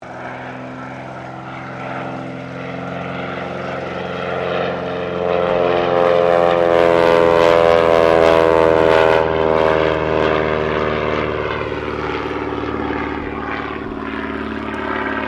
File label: AIRCRAFT CESSNA O-1 BIRDDOG PASS; Cessna Birddog spotter aircraft; Airplanes; Vehicles